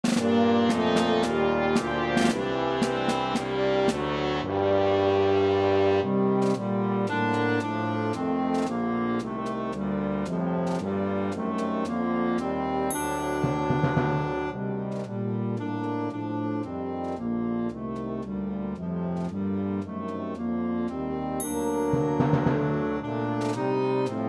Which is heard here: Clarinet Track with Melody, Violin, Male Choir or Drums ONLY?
Clarinet Track with Melody